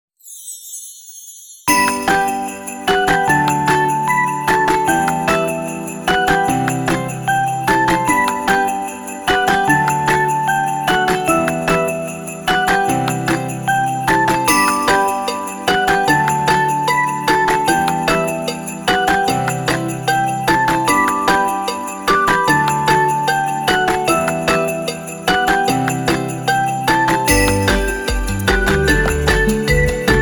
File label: Backsound Gameplay